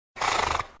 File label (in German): pferd